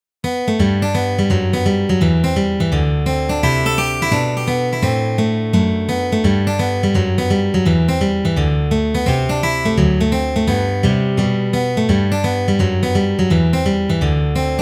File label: Hornpipe; Rockforest Hornpipe, The